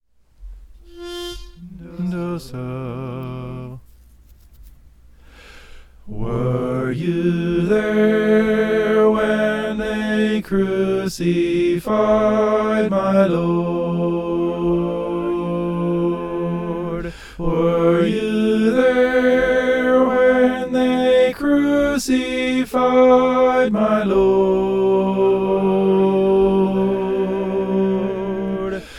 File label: were you there soprano